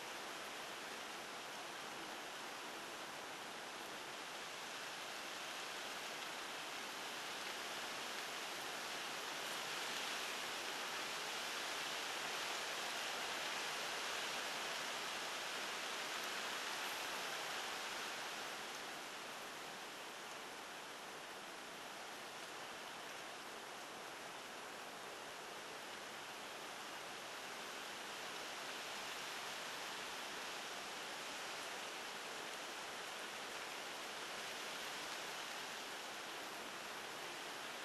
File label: Tree Breeze; Blackwater; Titanfall 2